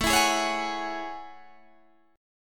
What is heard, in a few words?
A Major 13th, Guitar DADGAD; chord